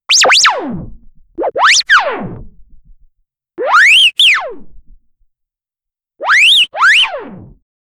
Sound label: cartoon fast twirling swi